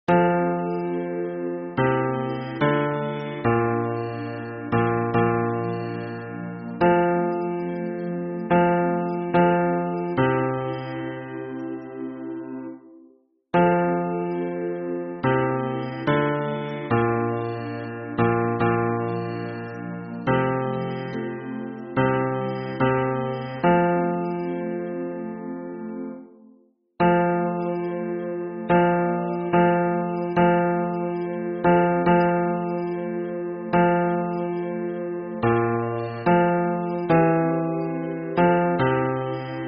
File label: hymn bass